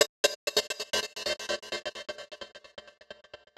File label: Gated Cymbal; musicradar